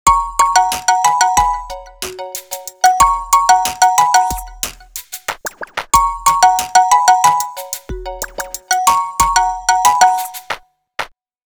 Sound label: Spring Box